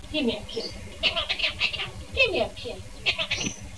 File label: kiss